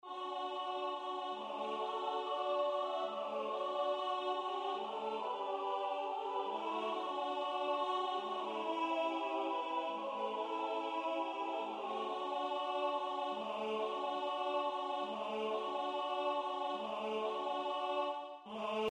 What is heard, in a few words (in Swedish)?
Sa lunka vi Vorspiel Kullerullvisan